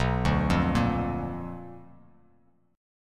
B Minor Major 7th Flat 5th Bass Standard; arpeggiated; JGuitar

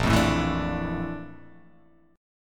Bb Major Sharp 11th Bb down, chord; strummed; JGuitar

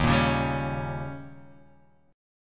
D sharp 7th D sharp

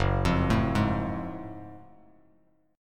F sharp Minor Major 9th, Bass Standard; arpeggiated

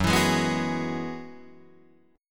Gb 9th Suspended 4th Gb, Guitar Drop D

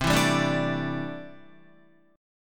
C 6th Add 9th C down Guitar; strummed; JGuitar

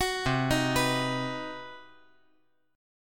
C Minor Major 7th Flat 5th chord

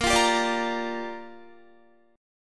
Bb 6th, Guitar; strummed; 18,20,17,19,17,17

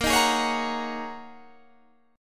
G sharp 7th Suspended 2nd Suspended 4th

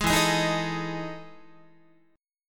E Major 11th E, Guitar; EM11 strummed; JGuitar

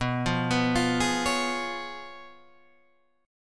Db 7th Cb, guitar; Db7/Cb arpeggiated; JGuitar

